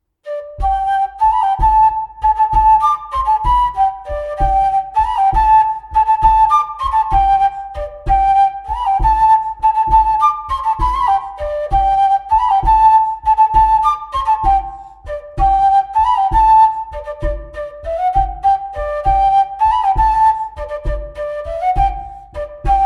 Montagnarde; Recueil De Raoulx

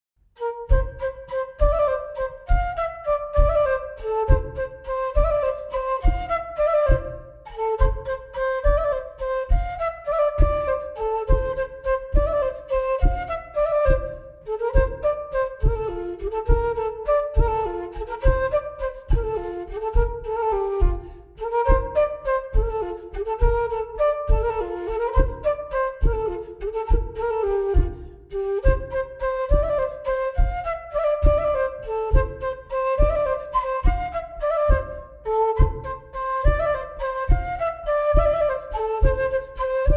bourree; Coatrieux

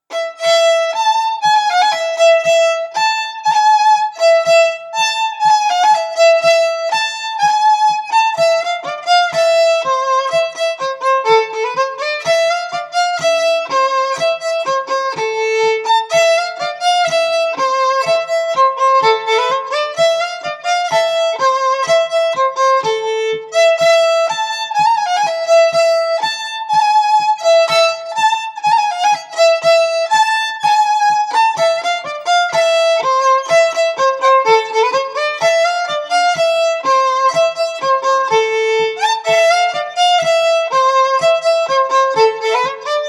Bourree

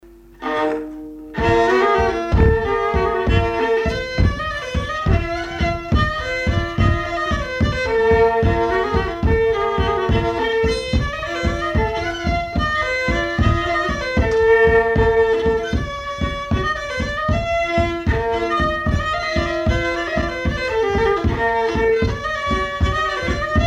La valse; La valse de Chabrier

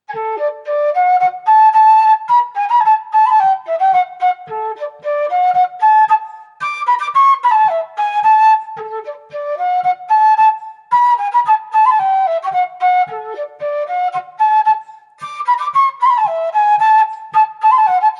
Bourree

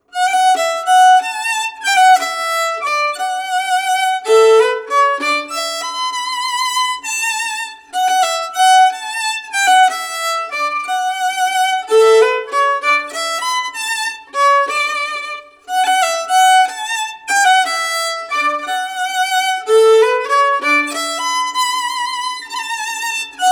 Valse

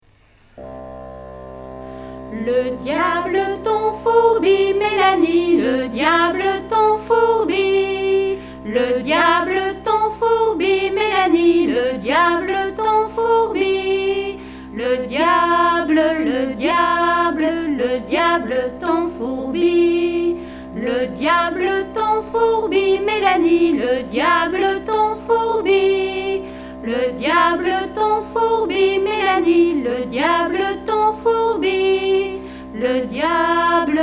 Branle Vx Fa lent x3; Diable ton fourbi

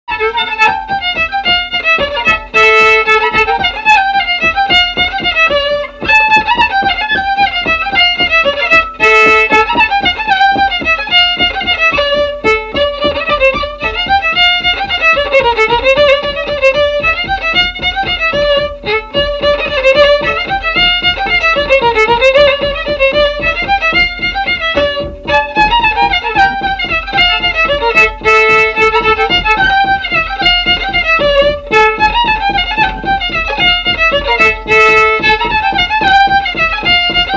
Bourrée; Bourrée de son père

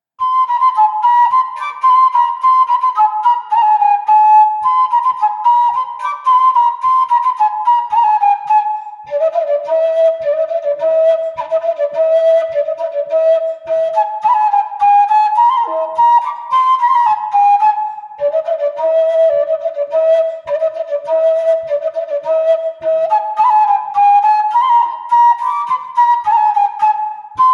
Danse des Auvergnats; Recueil De Laborde

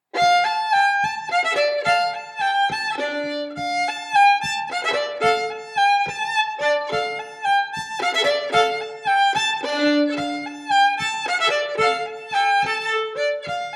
Montagnarde de la montagne en general; Recueil Hainl 2

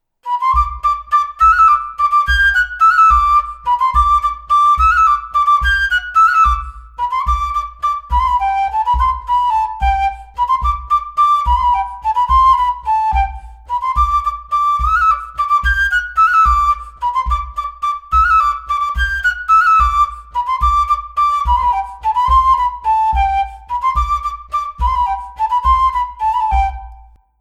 La bourree d Auvergne; Rec Delzangles

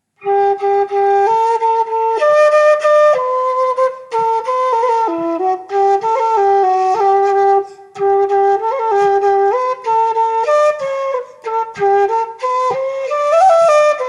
Air limousin

